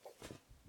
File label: Cloth Flip